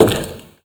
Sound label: foot tin roof, footsteps; Call of Duty Modern Warfare